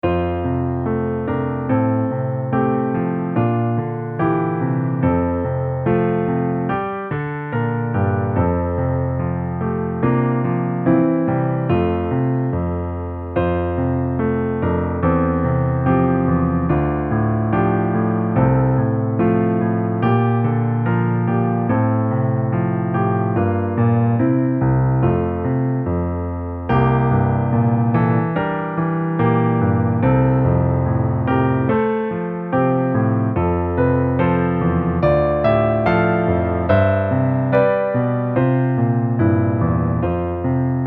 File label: LH Arpeggio